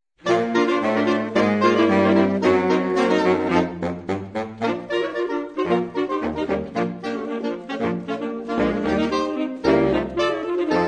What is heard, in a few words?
Sax